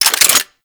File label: Hand Shotgun Reload Chamber; Tenno